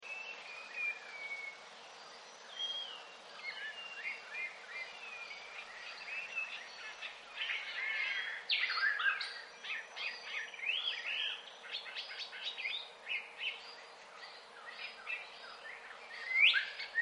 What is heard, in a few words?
ambient